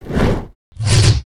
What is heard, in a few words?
totem golem attack